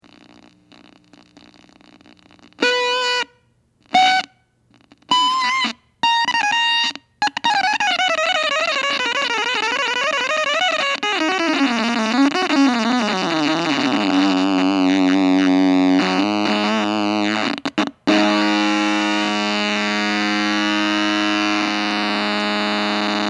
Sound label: guitar; MSP430G2231 Guitar Processor